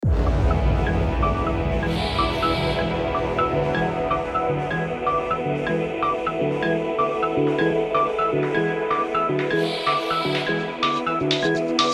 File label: MUSIC BED EDM HIGH ENERGY HAPPY CLUBS